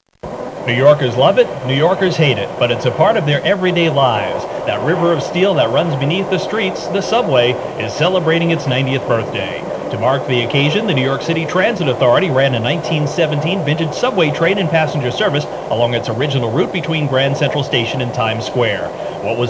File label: subway